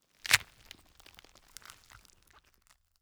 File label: Zombie Bite; Flesh, Bones & Gore